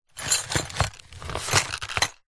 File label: cardboard box small containing metal screws and other pieces short movement; zapsplat